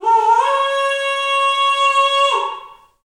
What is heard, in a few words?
Bullhorn, Afro Horns, Africa